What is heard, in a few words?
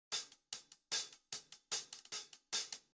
Drums HH